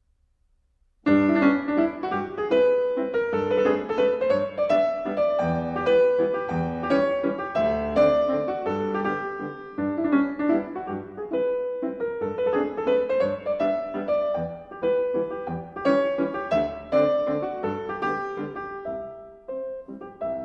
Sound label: Mazurka For Piano In G Major; Glinka